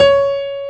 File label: PIANO C#4; AKAI S-Series CD-ROM Sound Library VOL-2